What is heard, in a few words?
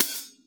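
RAW overhead, Drums Acoustic; AKAI; 90s sample CD; USB Soundscan vol.10; RAW KIT 8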